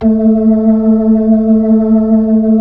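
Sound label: B-3, Hammond B-3 organ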